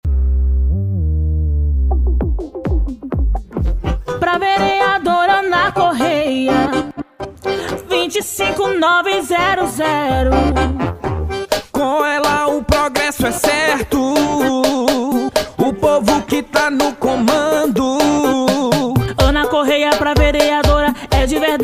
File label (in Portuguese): jingles politicos; eleicao 2020